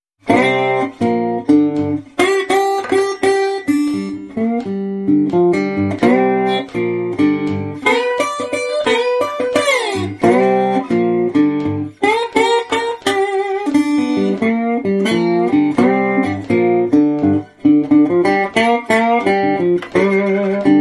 Resonator

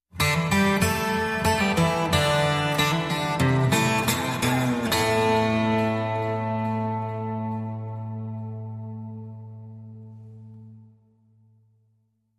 Aminor Guitar Only

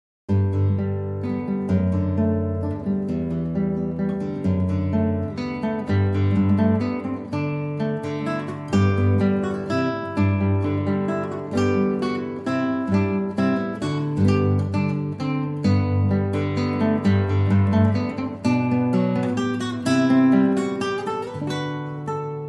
Solo Guitar

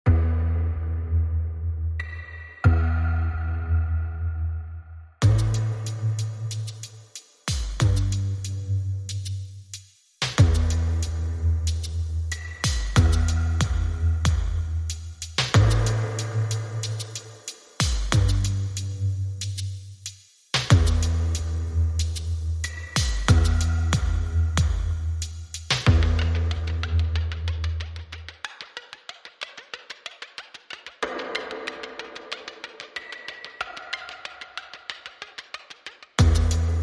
Drums and Bass

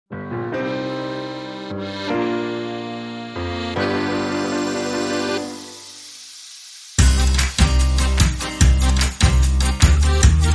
Blow My Whistle, Short Rhythm

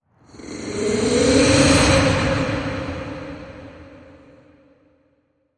Echoes Breathy Whoosh

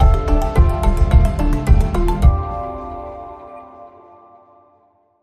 Bumper

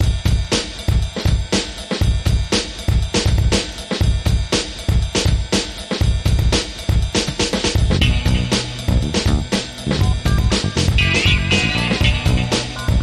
Funk

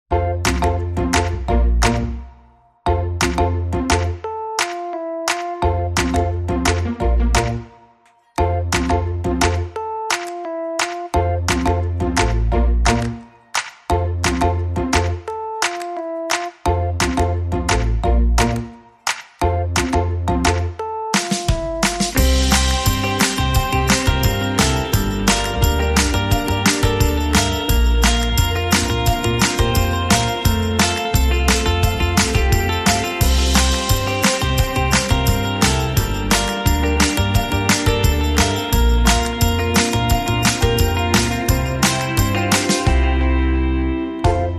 No Ukulele No Acoustic Guitar

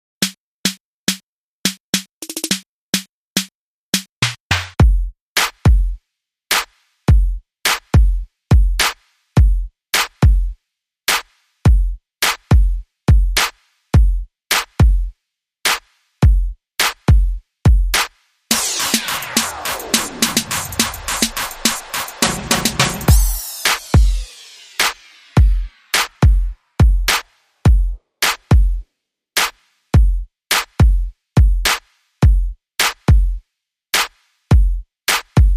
Horn Beat

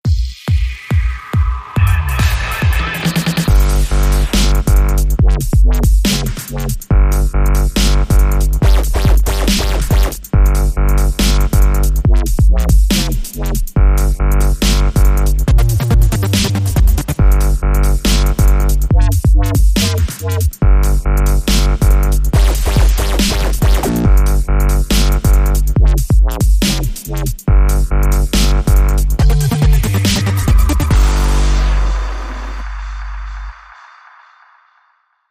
Low Frequency Oscillator, Short Rhythm

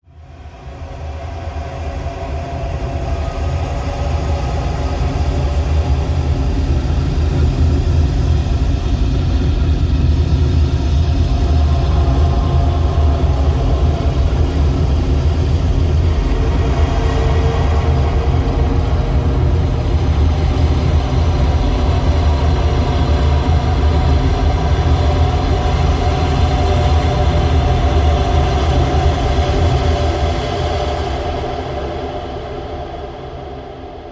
Expanse Icy Drone

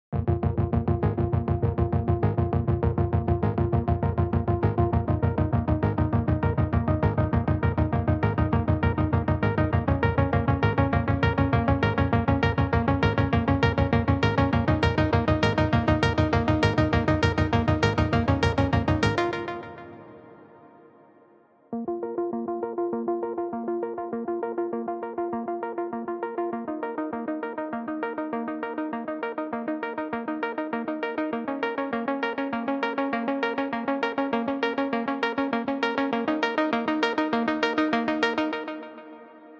Hacking Pulsing

